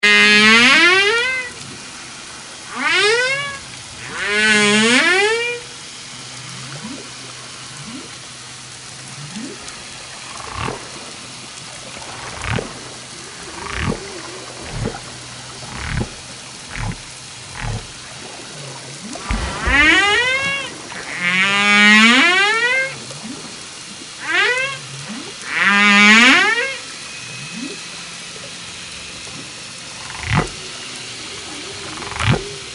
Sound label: Humpback Whale